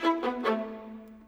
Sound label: Viola, Orchestral; Sonic Foundry (Sony Creative Software); Orchestral VOL-2; Modern 12